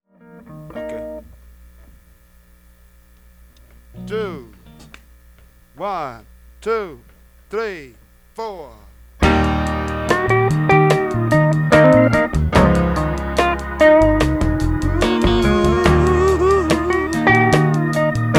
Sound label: scratch vocals